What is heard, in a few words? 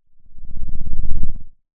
Sound of a lion’s roar